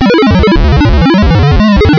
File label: bleepy